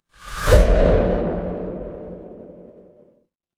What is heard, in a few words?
transition whoosh